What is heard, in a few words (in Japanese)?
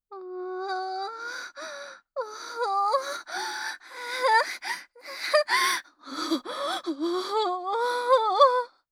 おぉぉぉ…♥おほぉ♥はぁっ♥